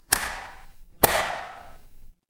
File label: office stapler